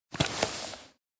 gasmask wear; anemoi

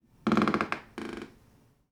wooden room squeak; anemoi

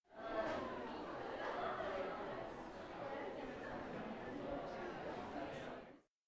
crowd stir; anemoi

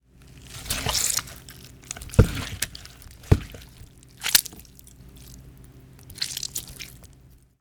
Knife stab; anemoi

